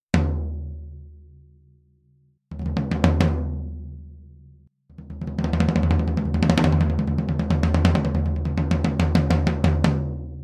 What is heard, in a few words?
Floortom Mic Shootout; Super2 Mics